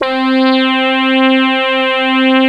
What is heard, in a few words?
orbit; Sound Effects for Multimedia - Volume 1